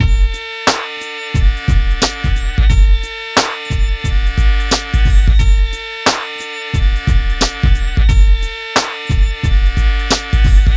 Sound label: Hip Hop and R&B Drumloops, mix; Best Service ProSamples vol.01; AKAI